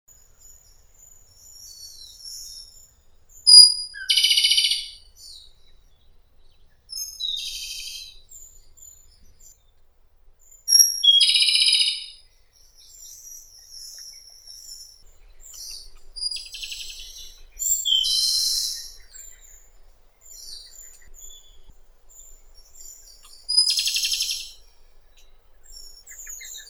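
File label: Japanese robin, Erithacus akahige; malinovka yaponskaya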